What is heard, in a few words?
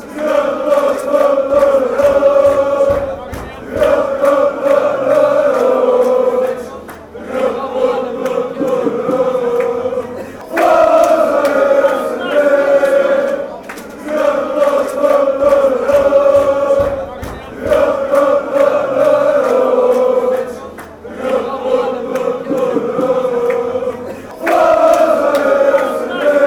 lo lo forza fcb